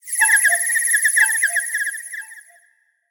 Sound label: turtle death comic tweety; smite; mercury